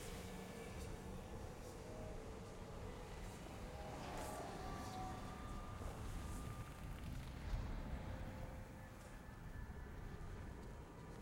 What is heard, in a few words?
amb streets